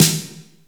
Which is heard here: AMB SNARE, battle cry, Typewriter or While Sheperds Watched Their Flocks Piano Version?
AMB SNARE